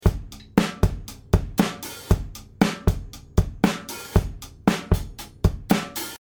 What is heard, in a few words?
Drums COMP3A Jr TIME B Red 10 full Gain 5 5 HPF